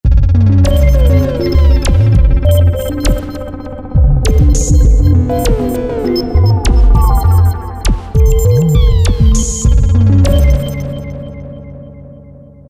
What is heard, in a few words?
Ambient, Roland D2